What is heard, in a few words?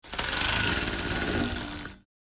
Metal venetian blind slat rattle roll down; gfxsounds